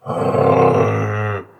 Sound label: idle, male zombie; nmrih; zerosiki; slender